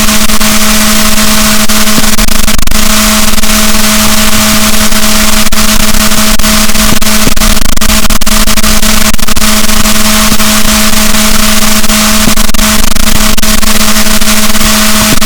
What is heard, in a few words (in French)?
rorquals, boat, Balaenoptera Physalus; SNR 6dB